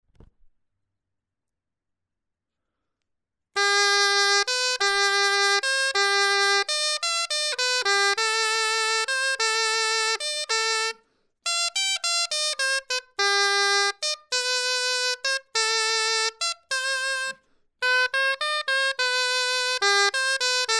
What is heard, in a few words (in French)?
clarin etude